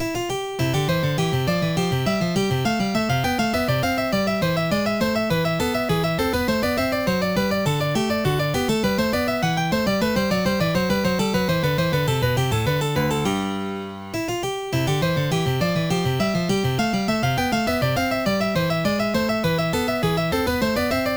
bourree, OctaMED; french suite vi; Plain Songs

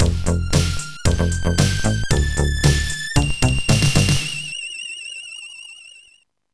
beaty beat; Sonix Music Driver

